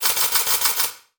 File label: Multimedia Internet CD-Rom Flash Click Multiple